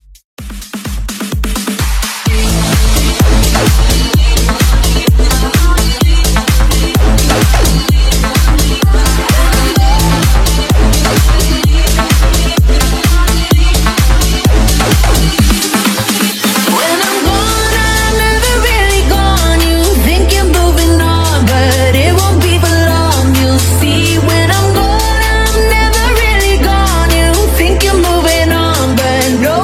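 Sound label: Club House 128 BPM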